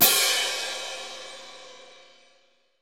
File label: ORCH CRASH; ORCH.COMP